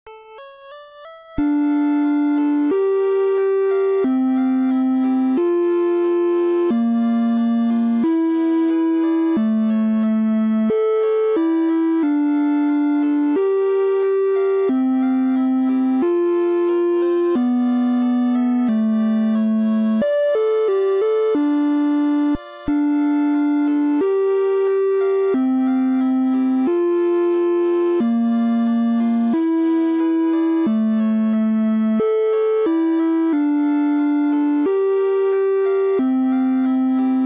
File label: Dead Music; Final Fantasy; NES